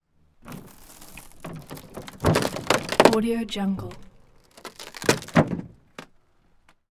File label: wood splinter